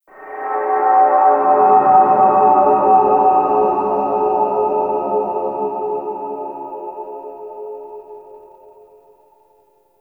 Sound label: Bell Random, Ambience Interior; Warframe; Orokin